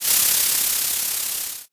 frying

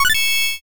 join

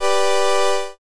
brass

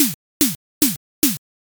footstep gravel

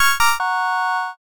ending

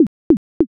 slot stop

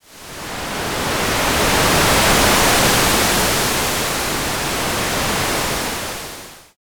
wave

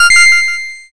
correct

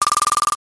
lever

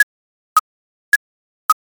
ticktock

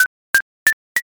nail

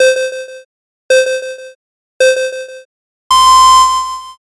countdown

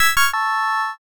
ending

